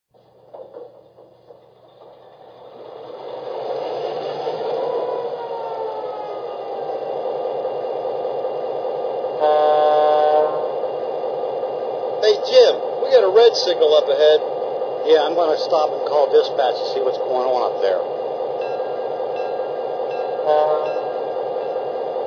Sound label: ft; acl